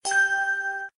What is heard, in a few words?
WIN MEDIUM; games; Cash Runner